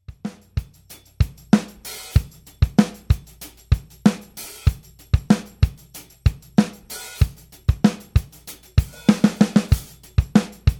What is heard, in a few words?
80s pop